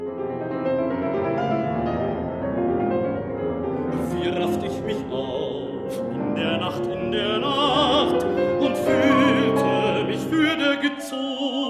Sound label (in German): Nachtgesänge Bariton & Klavier; Katia Bouscarrut - Klavier; Lieder